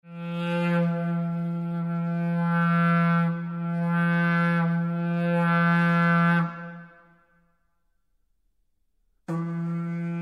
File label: End Blown The Kilmartin Bronze Age Trumpet